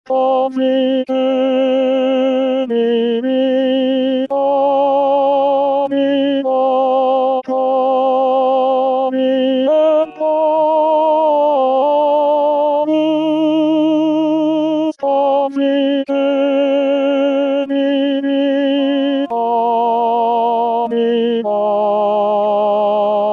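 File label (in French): Tenor confitemini domino, re bemol majeur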